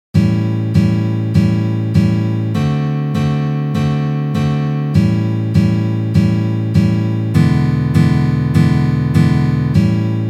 Blues Guitar Chord Progression

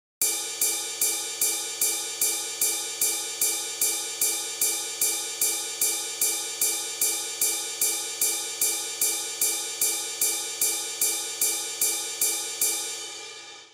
In Focus Ex1 Straight

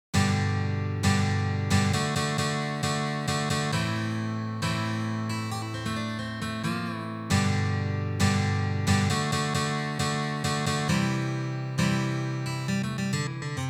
In Focus Ex5 Hard

